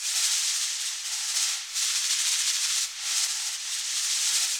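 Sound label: percussion rain stick; Roland L-CD701; Water Perc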